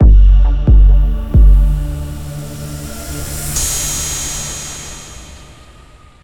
Transition; everspace 2

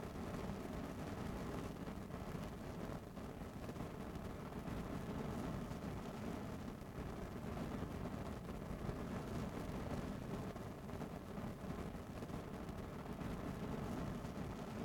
Loop Fire; children of morta; Procedural Events; Khersak

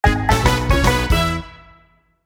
win jingle; spongebob squarepants whobob whatpants

